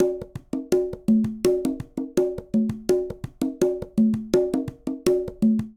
Rhumba Funk B Conga Tumba 166bpm, Latin Percussion; Ableton; Factory Packs